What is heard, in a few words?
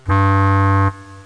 WOOD